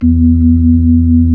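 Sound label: B-3, Hammond B-3 organ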